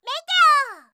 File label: meteor; fantasy